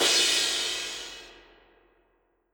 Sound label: crash; foxdot default